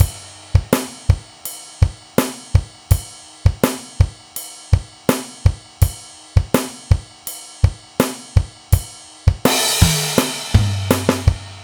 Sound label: Ride